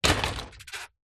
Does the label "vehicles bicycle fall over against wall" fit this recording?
Yes